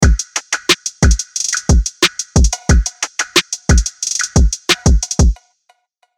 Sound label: Bubbles, 180bpm, Drum Kits